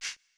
Shaker; Drums; Ableton Live 12 Lite Core Library

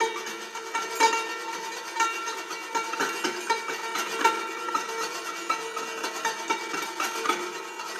Sandpaper Strings; Loops; Texture; Ableton Live 12 Lite; Core Library

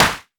Clap; Ableton Live 12 Lite; Core Library

